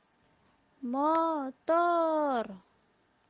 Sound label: motor, kenderaan; mari mewarna